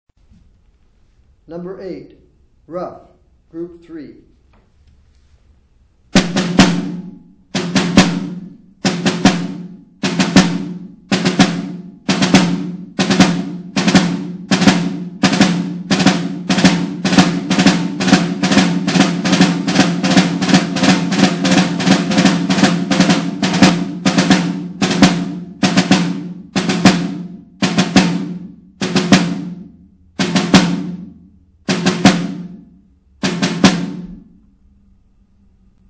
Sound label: snare rudiment